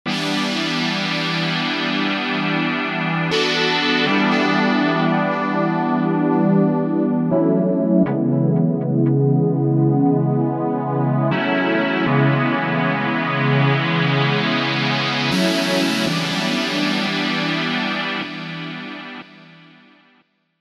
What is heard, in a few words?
Pad Pur